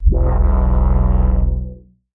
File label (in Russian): zvuk zagyganiya logotipa